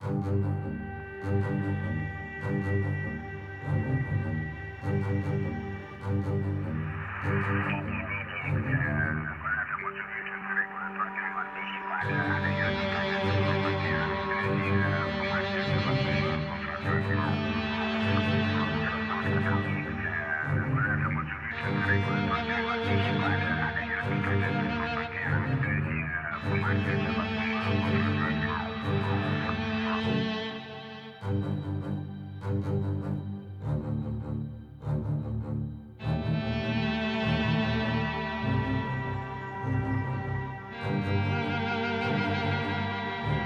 Action Fight Or Flight 140bpm Low No Perc; gamerip

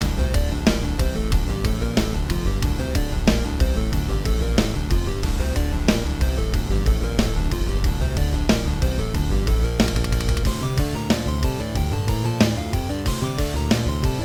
Boss 1 (Loop)

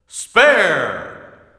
bowling spare; Ringling Bros. and Barnum & Bailey; Wii

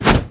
Boxing; Conquer Online 2.0

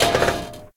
Platform Fall Creak

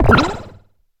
Stomp

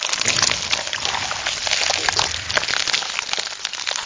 Creatureloop

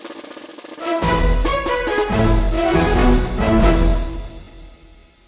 Winner jingle

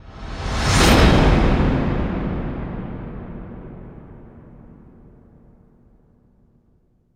Logo Woosh; Solar Shifter EX

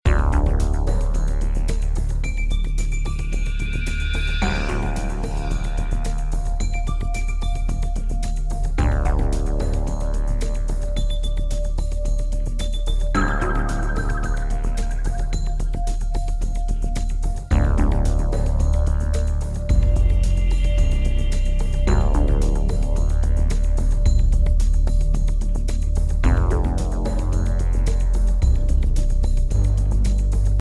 BGM Title

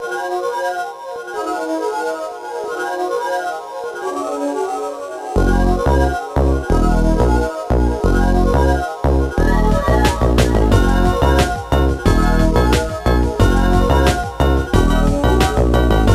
Underwater; Pinky and the Brain The Master Plan; GBA

